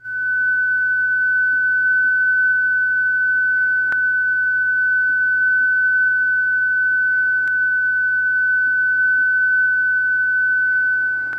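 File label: wspr, digital mode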